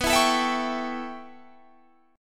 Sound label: A 7th B, chord; A7/B; strummed